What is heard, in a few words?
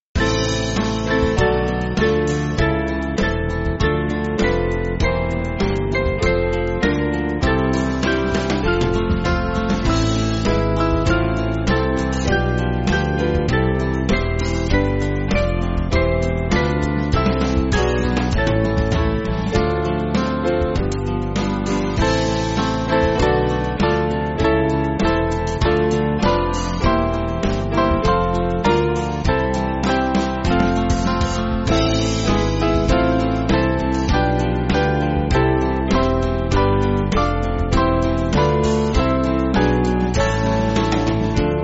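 Band, Music Backing Files for Churches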